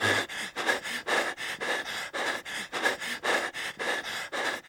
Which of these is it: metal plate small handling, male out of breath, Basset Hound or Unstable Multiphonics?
male out of breath